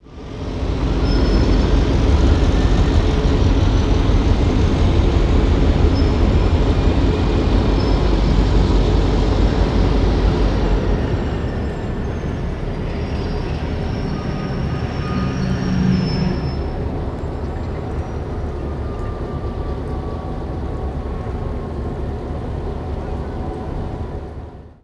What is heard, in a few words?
Train Shunting